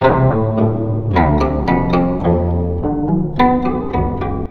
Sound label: KOTO LP FX, KOTO FXLP; USB Soundscan vol.60; AKAI